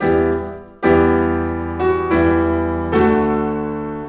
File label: piano test